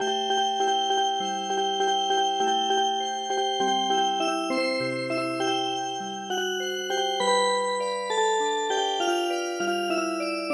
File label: Music Box, midi; Hail To Britannia; 癒し系のアレンジを施したオルゴール曲; 「ヘイル・トゥ・ブリタニア」は、アップテンポな3拍子の未設定の童謡です; ＰＣ ＭＵＳＩＣＢＯＸ; オルゴール曲のMIDIファイル